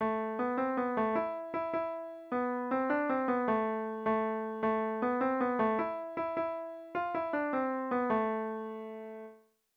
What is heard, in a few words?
tarantella beginner piano